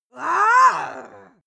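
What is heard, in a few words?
female aggressive, screams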